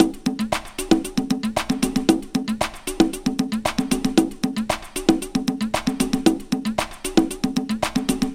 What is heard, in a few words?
Poppy Electro Live